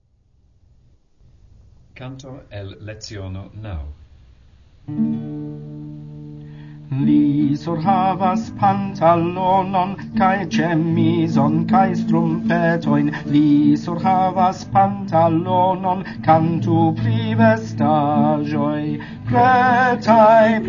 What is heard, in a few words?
sample song